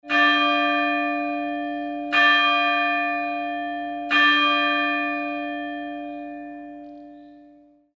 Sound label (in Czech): zvon; barbora; zvonohra tony